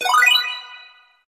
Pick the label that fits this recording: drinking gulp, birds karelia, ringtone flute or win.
win